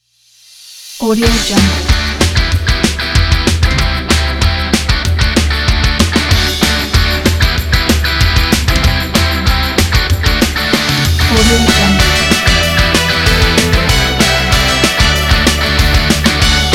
indie rock; ultra sonic

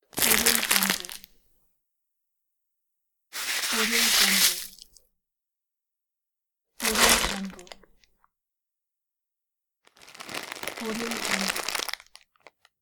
rattled plastic bags

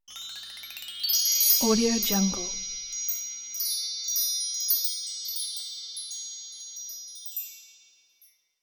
magic chimes

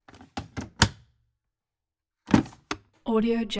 drawer washing machine

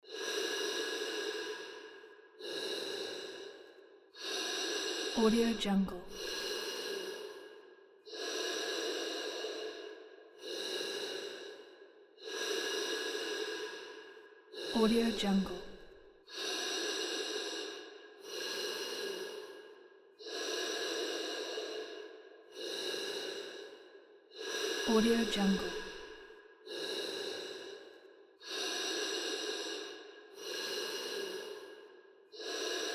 cosmonaut breathing

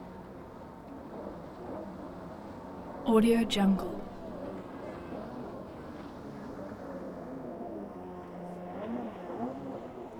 jet skis surges

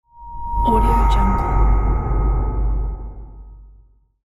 rumble

